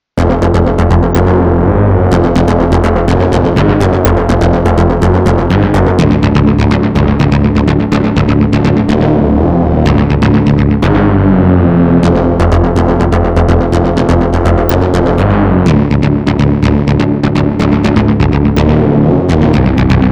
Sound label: French bass